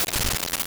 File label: noise